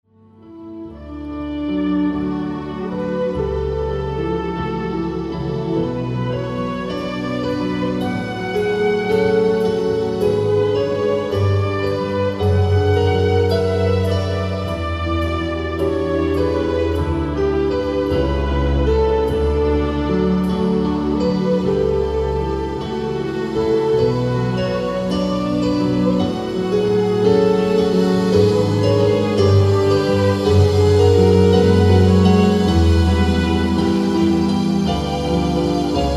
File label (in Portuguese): Piano e Cordas; Quinta sinfonia Tchaikovsky